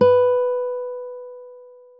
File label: pluck shaped forte